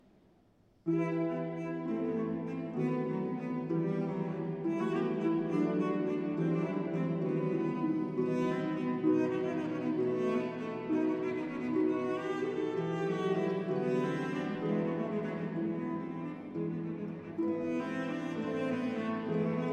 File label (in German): Vivaldi Sonate e moll IV Allegro